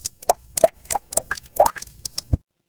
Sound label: water drip